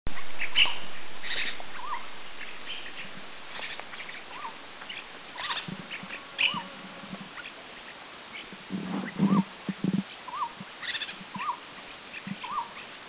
Ashy Bulbul, Thailand; Doi Lang

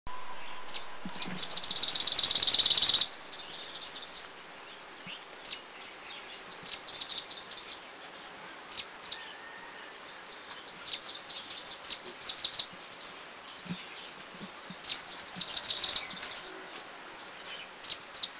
Grey-breasted Prinia, Thailand

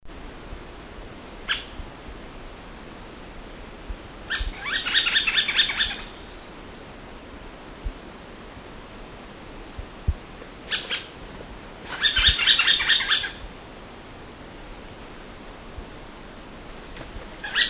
Pale-headed Woodpecker, Laos; Na Hin; George Wagner

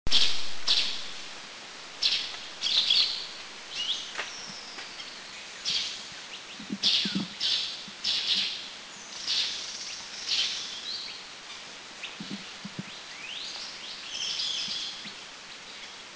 Sultan Tit, Laos

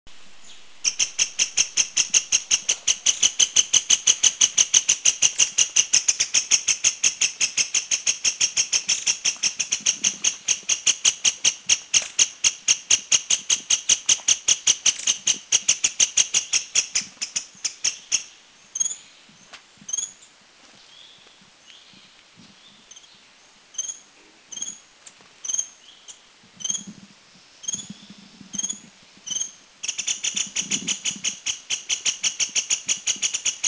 Dark-backed Sibia Calls, Doi Lang, Thailand

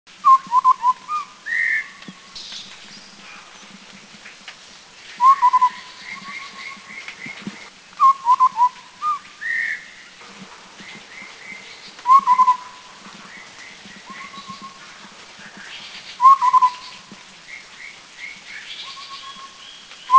White-browed Scimitar Babbler, Thailand

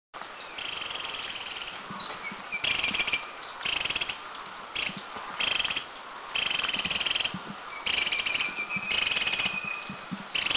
Slaty-bellied Tesia Alarm Calls; Eaglenest; India

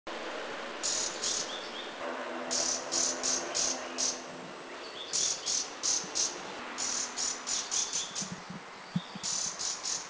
Large Niltava Call; Thailand

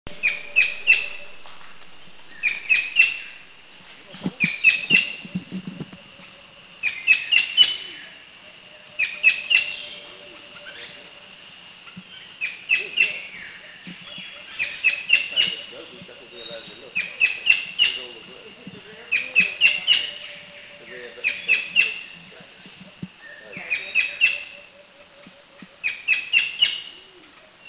Rufous-lored Kingfisher, Philippines

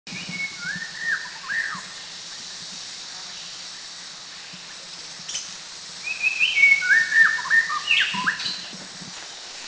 White-rumped Shama

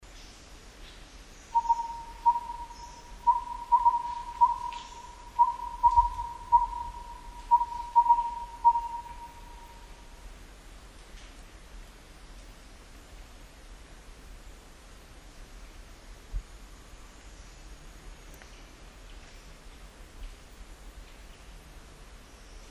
Collared Owlet; Laos; Na Hin; George Wagner

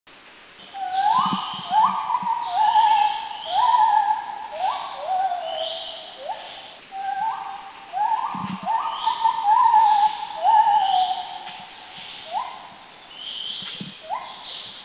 White-handed Gibbon; Thailand

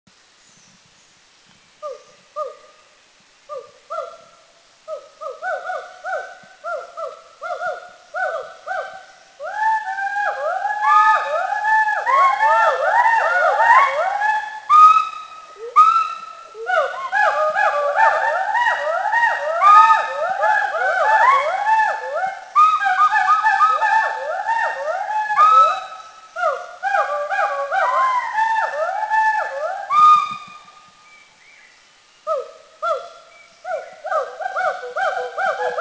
Hoolock Gibbon; Mishmi; India

